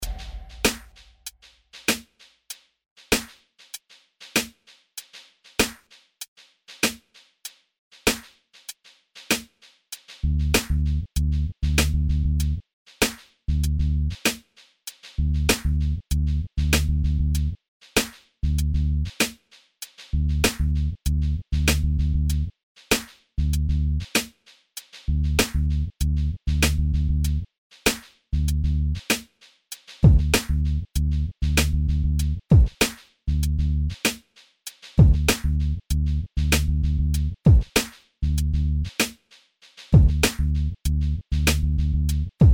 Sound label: groove